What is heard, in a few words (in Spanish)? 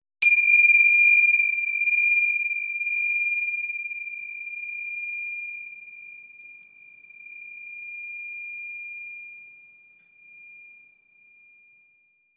crotalos